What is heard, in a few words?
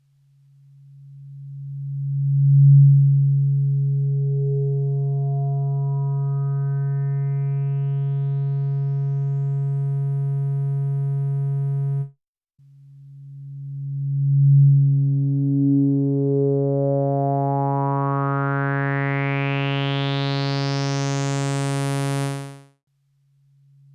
Moog Sub25 Filtersweep 50 Res